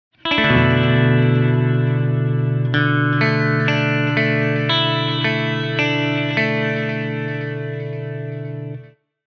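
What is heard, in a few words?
guitar Delay analog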